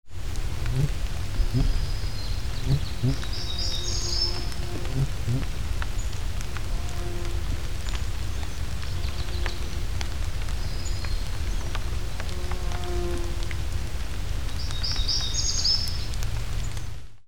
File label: RAIN